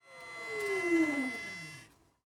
vehicle electric turnoff

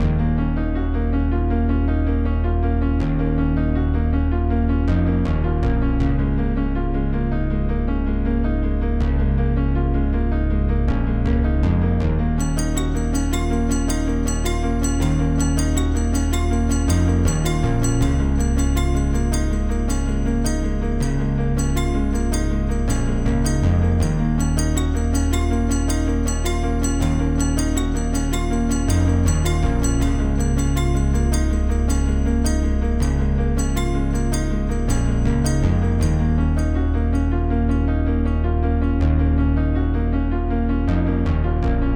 ending menu loopable, Music; Five Nights at Ronald's

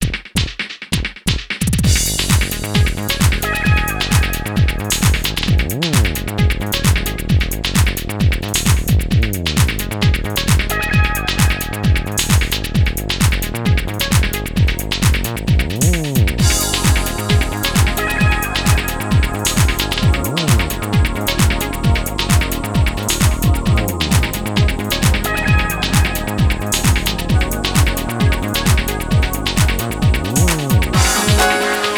Stage Music; super monkey ball adventure

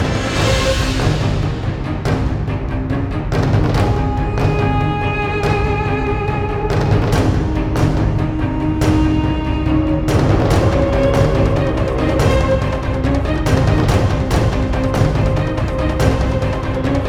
Boss Fight loop; Gordian Quest; Act II

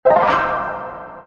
Horizontal or Vertical + Diagonal Line Combo

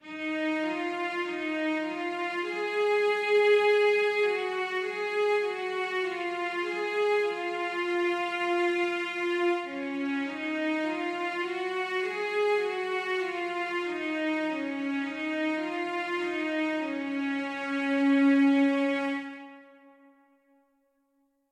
Chicken Song BGM Cello

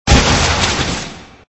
Smash Cage

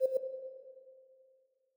Move D6 Mf

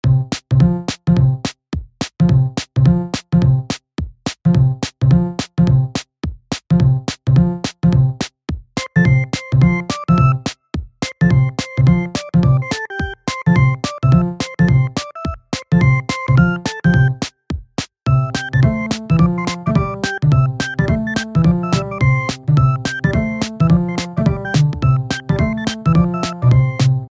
Gameplay (Speed 5); Dumb Ways to Die 2 The Games; Android

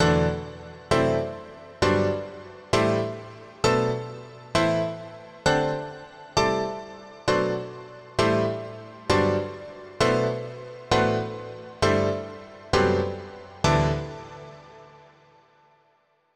Dance Piano; super crush k.o.

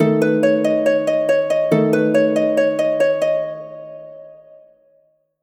mysterious; ashes of the night

